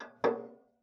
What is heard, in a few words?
Ping, Kitchen Toaster